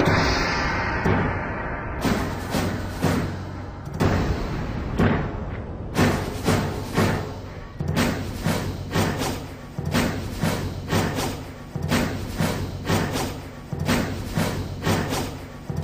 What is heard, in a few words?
tribal war chant, drum loops